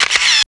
Camera Shutter

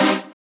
Stab Synth Cmin; One Shots; Ableton Live 12 Lite; Core Library; Evoke

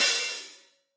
Hihat Open

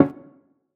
Stab House DMaj7

